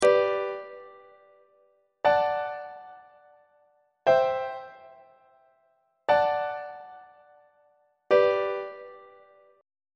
g major root position iac on treble clef

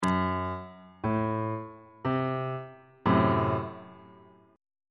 f sharp diminished chord on bass clef

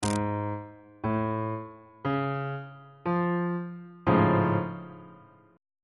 b double flat augmented major 7th 3rd inversion

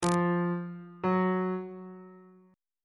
f sharp 8th intervals

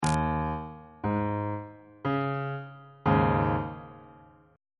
a flat suspended 4th 2nd inversion

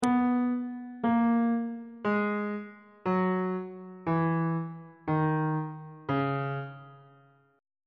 c sharp dorian mode descending; bass clef

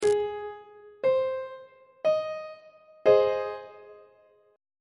a flat major chord on treble clef

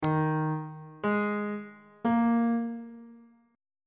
d sharp suspended 4th triad chord intervals

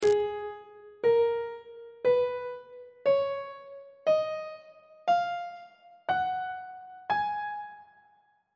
g sharp dorian mode on treble clef

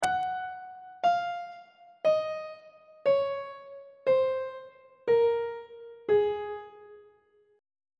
a flat mixolydian mode descending on treble clef

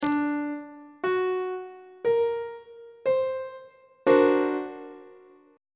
c double sharp augmented 7th chord on treble clef

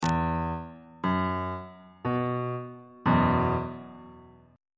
f flat suspended 2nd chord on bass clef

